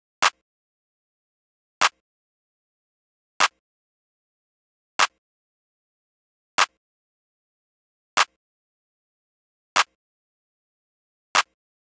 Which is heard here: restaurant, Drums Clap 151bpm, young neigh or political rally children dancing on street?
Drums Clap 151bpm